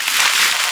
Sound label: bean loop